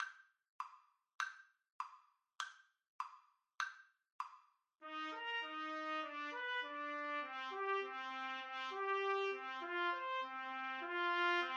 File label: silver sand rag trumpet duet